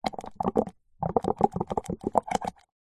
milkshake thick blow bubbles through straw in glass short burst